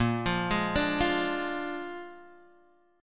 Bb 7th Bb Guitar Half Step Down, chord

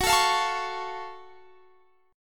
Gb Minor Major 7th, Guitar, Standard; strummed; x,21,19,22,22,21; JGuitar

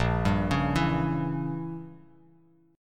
A sharp Diminished 7th, Bass Standard; arpeggiated; JGuitar

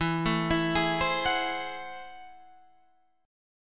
A Minor Major 13th

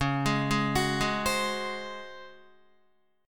C sharp Major 7th Suspended 4th C sharp Guitar; C#M7sus4 arpeggiated; JGuitar

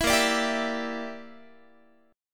A sharp 6th Flat 5th, Guitar; A#6b5; strummed

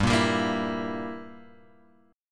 G Minor 6th, Guitar Open G

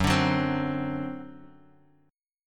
D 7th, F sharp

